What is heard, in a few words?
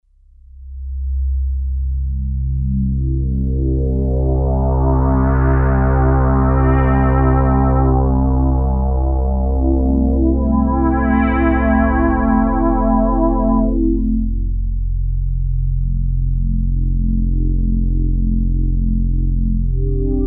Synth Sweep, Roland Alpha Juno-2; Factory 2